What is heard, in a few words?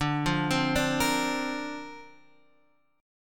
D-Minor 7th Sharp 5th D Guitar Half Step Down, chord